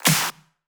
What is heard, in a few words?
Pre-Shifted Claps; Vengeance Samples